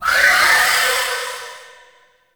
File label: Blade And Whip Heavy Vocal; melee; weapons; Warframe; Dagath Blade And Whip